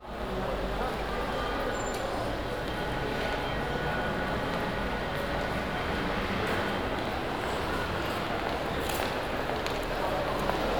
OUT DOME, Cathedral Organ; ILIO; Propeller Islands

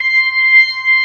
B3 C 6, organ; AKAI S-Series CD-ROM Sound Library VOL-1

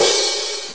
HiHat, Blues; Sonic Foundry (Sony Creative Software); Drum Tools

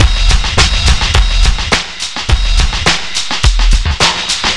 boombjack, 105bpm; Zero-G; Freak Beats

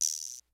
CLASSIC, DRUMS; Kid Nepro; Elite

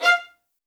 MED ATT F5, soft violins; Q Up Arts; Denny Jaeger Master Violin Library

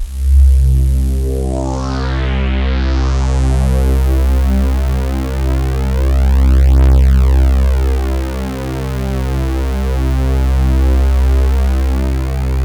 XXL SWEEP, BIG SWEEP; Masterbits; Vintage Voltage

